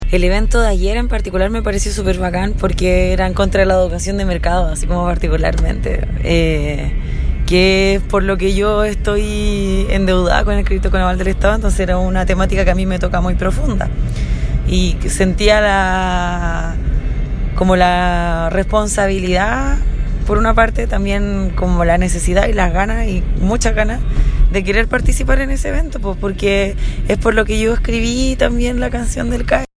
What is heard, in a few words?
hum